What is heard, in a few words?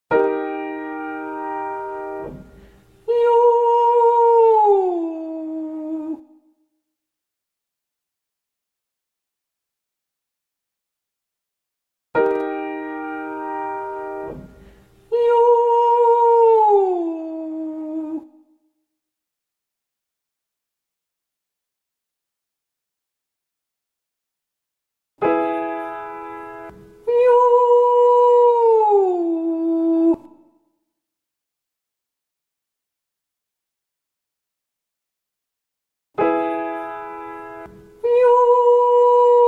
Yoh Cry Ascending; vocalfitnesstraining; vft practice plans